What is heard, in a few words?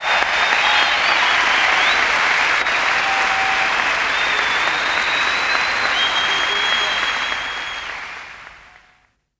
Ambiences, Places and Ambience